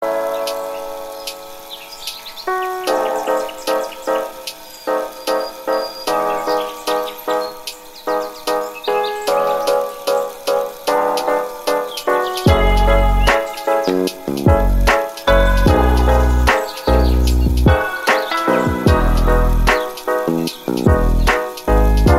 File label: Lofi